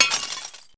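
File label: short break, destruct; Wired for Sound Pro CD; Aristosoft, Inc.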